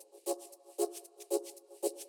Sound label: music freespin intro shaker; reef raider